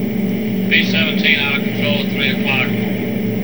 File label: B17 Program Error; Vehicles; DesktopThemes2000